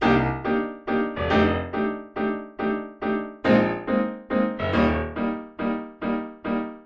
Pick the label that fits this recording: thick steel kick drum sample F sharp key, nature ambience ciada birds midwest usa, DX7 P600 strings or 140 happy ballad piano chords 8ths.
140 happy ballad piano chords 8ths